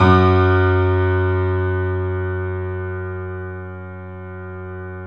Yamaha C7, 3D Pianos; E-MU Producer Series Vol. 5

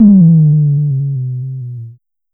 Drum X Tom, Pearl

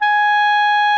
CLARINET G#5, REEDS; Greytsounds VOL-1

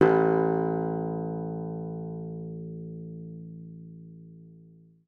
A1, guitar; Xsample VOL-2

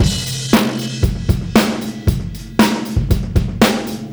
116, Beat Loops; Zero-G; Monster Beats

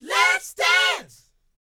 LET, Vocal, R&B Dance, DANCE 120

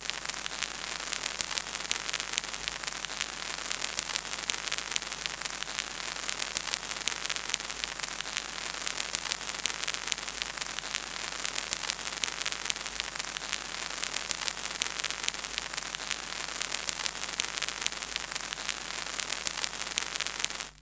Crackle; Zero-G Smoke

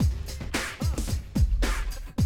110, Classic Drum Loops

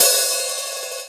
Yamaha CS6, Drums

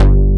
BASS; Pro-Rec - Synthsonic; JV ANALOG

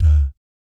DAT D2, Jazz FX; Spectrasonics; Vocal Planet; JAZZ COMBO

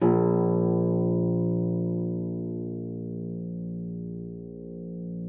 harp forte; Xsample VOL-8

